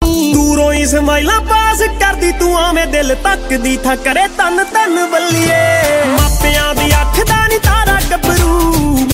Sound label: Punjabi Song